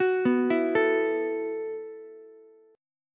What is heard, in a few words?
E Suspended 2nd Suspended 4th E, Ukulele; arpeggiated; JGuitar